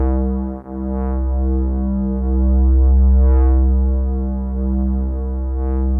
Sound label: Memorymoog, bass; OP-Z